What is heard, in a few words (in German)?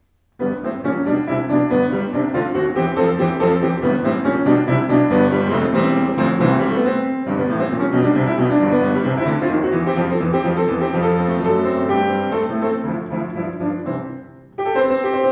Schimmel Buche Rag, Klavier